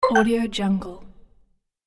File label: accept toy computer interface; sick audio